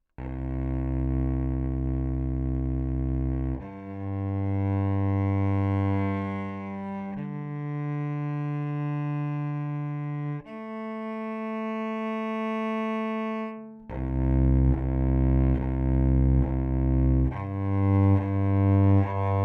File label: Cello Pickup Baggs Treble